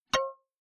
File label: Digital Click Neutral